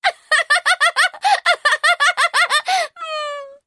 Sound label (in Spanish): risa